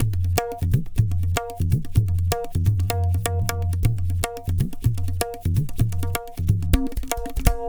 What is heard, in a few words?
Tabla, 125 BPM; Zero-G; Pure Tabla